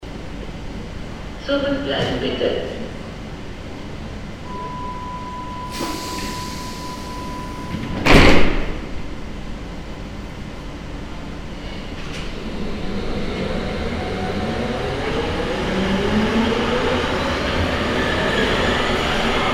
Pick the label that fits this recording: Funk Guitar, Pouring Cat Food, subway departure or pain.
subway departure